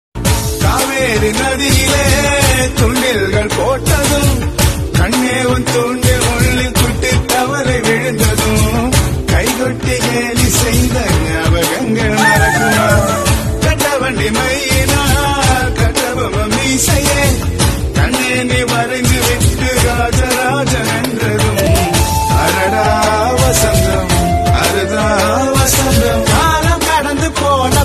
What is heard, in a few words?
song ringtone